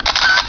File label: Shot Tone, camera; BenQ-Siemens EF51